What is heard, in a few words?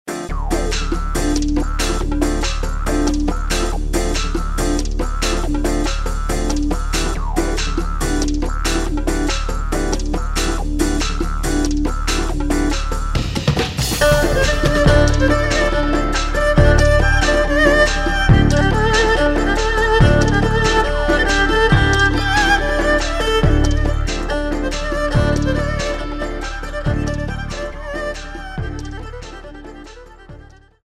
Fluent Melody